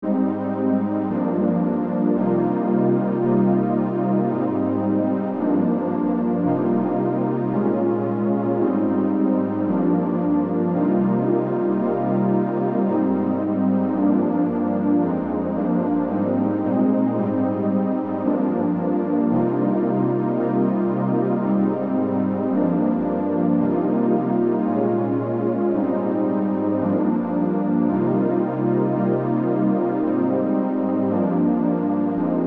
Synth Pad

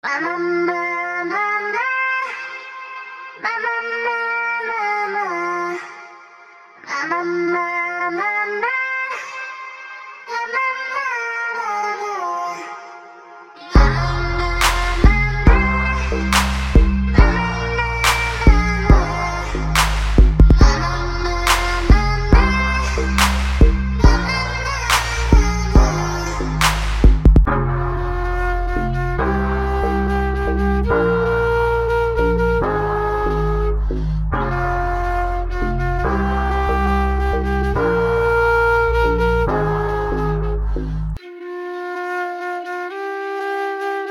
70 Fmin; Aetherpop; Look Its Zelda

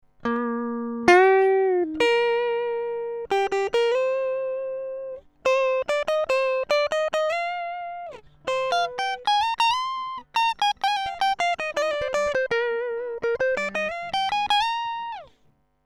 Tone dry, Guitar Tone

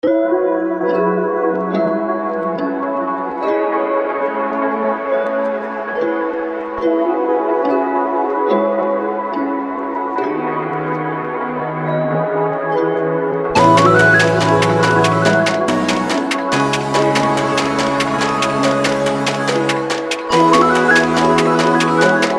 Aetherpop Steady Coffee 71 F min

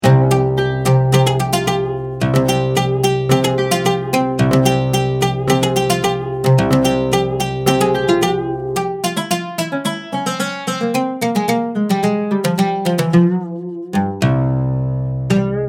Oud Solo, Turkish Oud

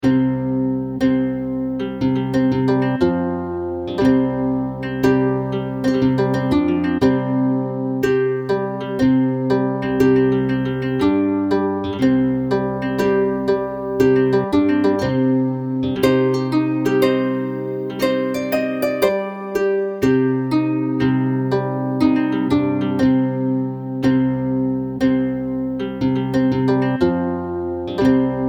celtic harp solo

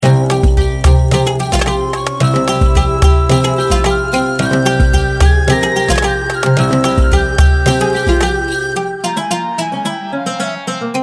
Oud Full, Turkish Oud

